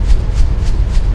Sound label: fan, ambience; cstrike